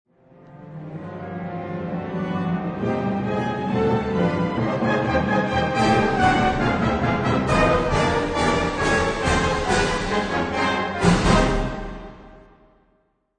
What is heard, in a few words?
Orch Crec